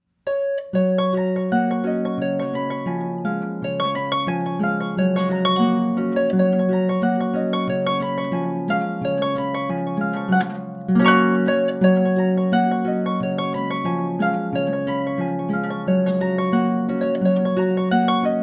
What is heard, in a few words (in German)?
polka